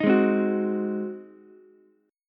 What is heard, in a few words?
Ab 7th Ab down Ukulele; Ab7 strummed; JGuitar